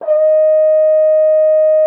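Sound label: French horn D#3; Xsample VOL-3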